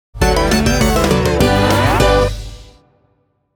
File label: sting; Duende Sounds